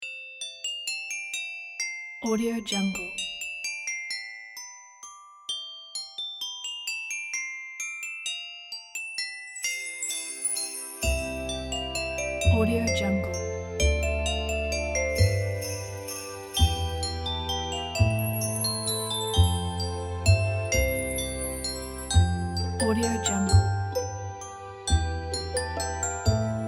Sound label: christmas lullaby